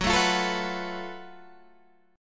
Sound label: F sharp Minor Major 7th Double Flat 5th, Guitar Standard; strummed; 14,12,15,14,12,14; down